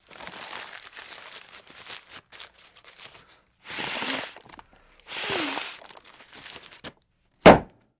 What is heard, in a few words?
Paper Bag Blow Up Po